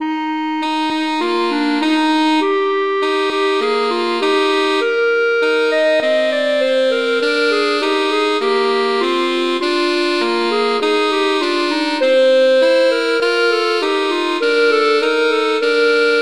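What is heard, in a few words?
Duet, horn; Mozart; Duets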